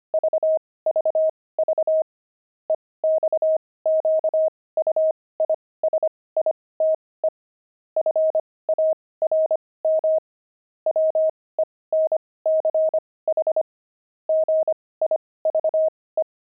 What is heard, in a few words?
pangram, intermediate pangrams files; jolt